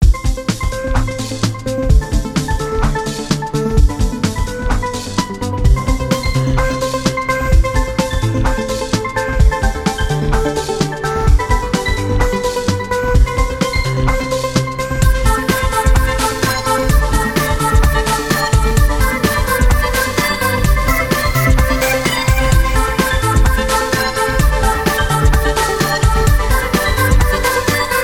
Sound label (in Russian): nauchnofantasticheskaya zastavka k filmu